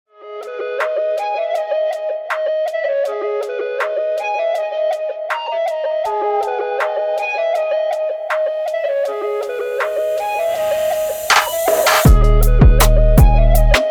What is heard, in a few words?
trap; temple